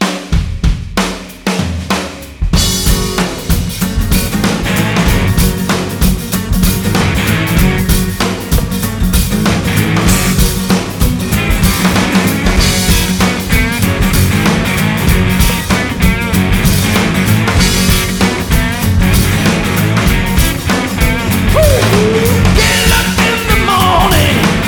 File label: Blues